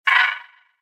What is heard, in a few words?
stab